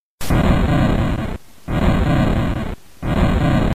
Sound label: Flame of fire Sound Effect; Super Mario; 超级玛丽音效